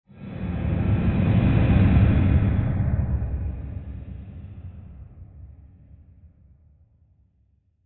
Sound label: Swell Airy Rush Rumble; Sound Design; Swells